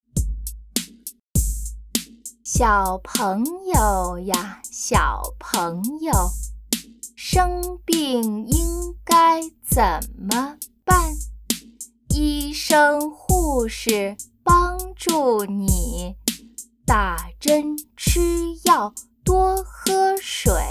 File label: Chant